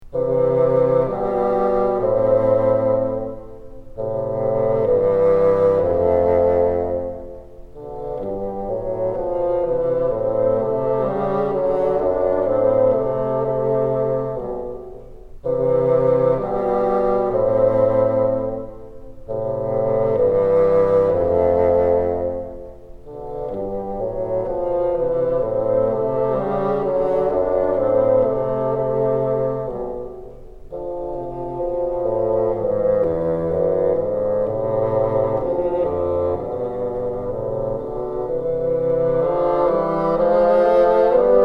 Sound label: together, bassoon